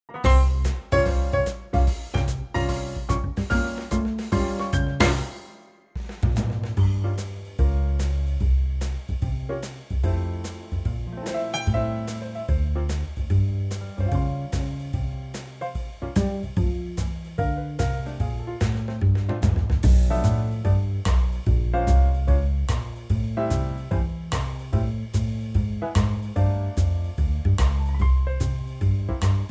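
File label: F, backing track; key - F - vocal range - C to F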